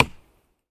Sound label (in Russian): slabij tihij stuk po derevu